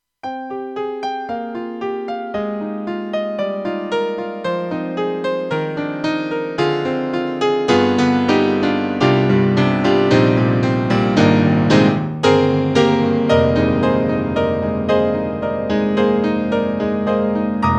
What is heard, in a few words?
Piano; Roland; JV-2080; GM; Piano 3